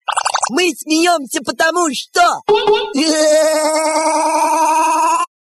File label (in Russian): smeh